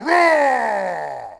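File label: Attack Vox; Sid Meier's Civilization 4 Complete; Warlords; Units; Omen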